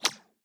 Blaster incoming

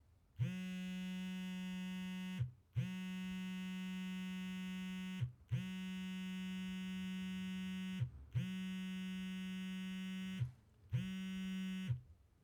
Cellphone Vibration On A Wooden Box

